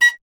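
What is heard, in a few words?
FLUTE PICCOLO A; Miroslav Vitous - Solo Instruments VOL-2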